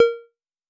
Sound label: menu; DanmakuProject